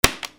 DOORS

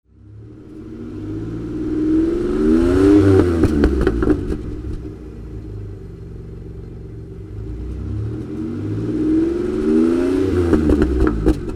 CARS

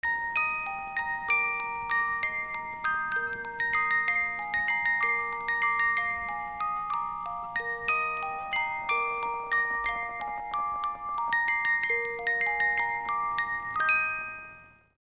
MUSICAL

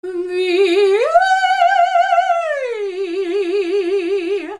PEOPLE

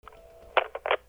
PHONES

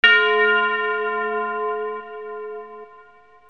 BELLS

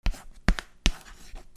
FRICTION

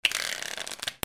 CASINO

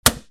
OFFICE

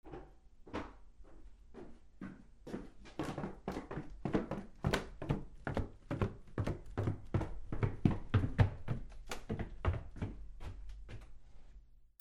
FOOTFALL

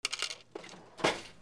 HOUSE

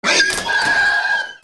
ANIMALS

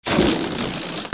TOYS

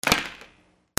FALLING

